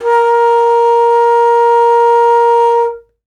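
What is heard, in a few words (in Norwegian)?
fløyte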